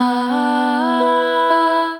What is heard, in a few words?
Combined Rack Root B, Basic Vocal Racks; Voice Box; Harmonic Vocal Racks